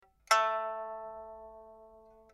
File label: pipa; chuYinKouXian